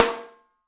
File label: MARCH, 3D Drums; 3D Sound; MARCH XTREM